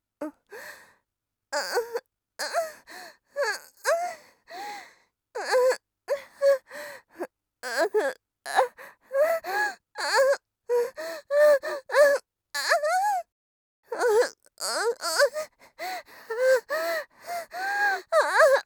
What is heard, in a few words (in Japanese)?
喘ぎ声